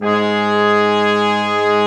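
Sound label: BRS Quintet long, brass quintet; Roland L-CDX-03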